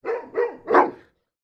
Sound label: animals dogs st bernards x2 barking; sound effects one